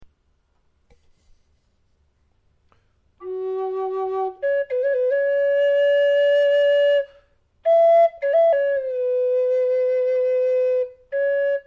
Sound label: improv, flute